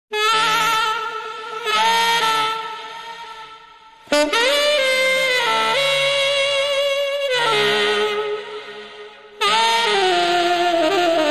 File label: Haunted Sax